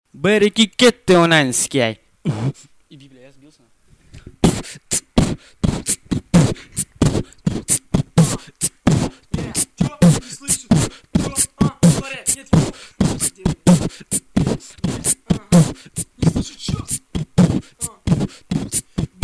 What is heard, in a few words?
beatbox